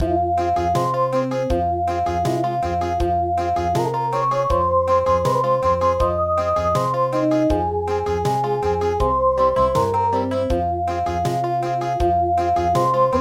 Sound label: Country